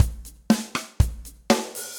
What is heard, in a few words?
Kit Cold Tight Room, Drums; Standard Kits; Ableton; Session Drums Studio